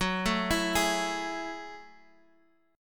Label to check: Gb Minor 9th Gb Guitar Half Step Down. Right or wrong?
Right